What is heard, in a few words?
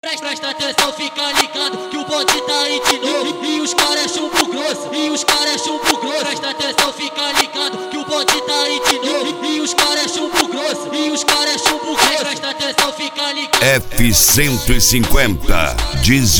pancadao